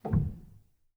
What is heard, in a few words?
rel, Grand Piano; bitKlavier Grand; Piano Bar